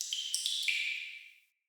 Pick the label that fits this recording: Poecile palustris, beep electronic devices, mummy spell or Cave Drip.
Cave Drip